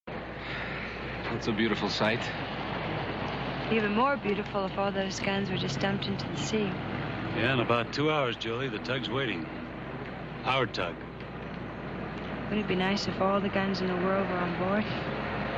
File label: guns